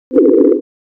cluster sine

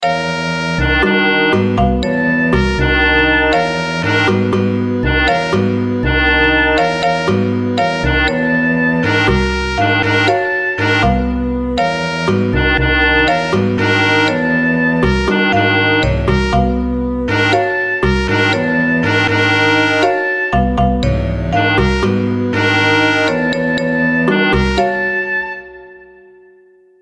Feedback Chromatic

